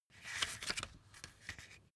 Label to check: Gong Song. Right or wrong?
Wrong